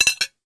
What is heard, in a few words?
household knife down on plate; sound effects one